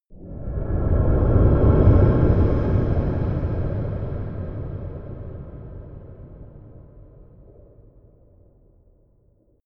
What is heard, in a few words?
whoosh rumble